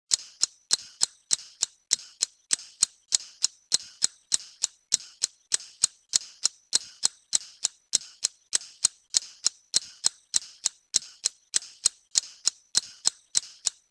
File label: tick Tock Long